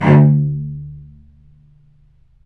SPICCATO C, Spiccato Celli; StSpiccato Celli

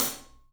Studio Hats, 3DPercussives

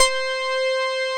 SYNBELOC5, SYN-BELLO

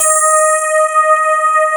sparkles

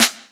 SD, HIP, Dance Mega Drums

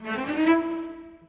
Cellos, Orchestral; Sonic Foundry (Sony Creative Software); Orchestral VOL-2; Modern 12

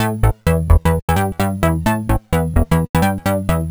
A 129, Synth Loops, Acidized; Zero-G; Soundclash Nu Dance Electro; 129bpm

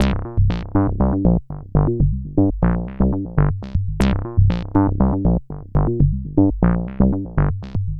Bassline, Prototechno

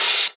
Open HH, Cymbals

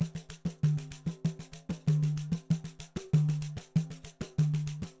Percussion works loops; Sampleheads; NYC Percussionworks

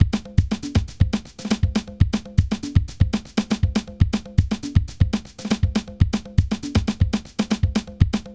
JAZZ, Funky; INIS

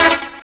DR. LOOP; X-Static Goldmine 3; CHORDS

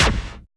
GATED ZAP; TEK PERC1; Drums In Your FACE!

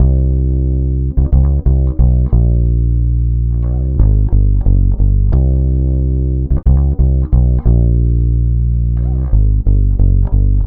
Vintage Fretless, Electric Bass

